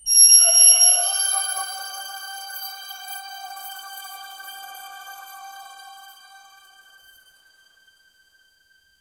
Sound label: short mystical button cli, magical shimmer